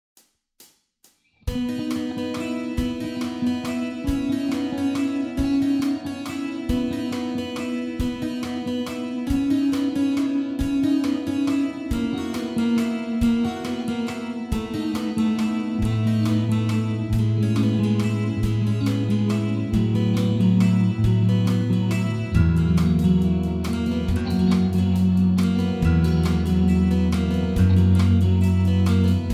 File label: happy, instrumentals